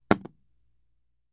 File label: Mug Down On Table; Glass Mug Set Down On Wood With Light Thud. - Glass Set Down; glass clinks